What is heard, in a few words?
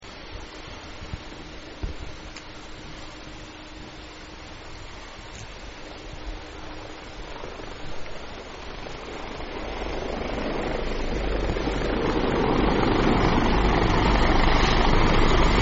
NoShip, helicopter